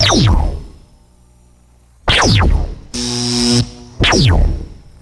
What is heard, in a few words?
retro sci fi laser blasts; lasers